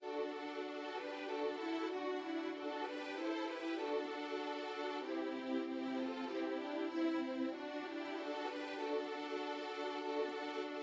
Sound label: violin, violin trio; promenade; tunes for three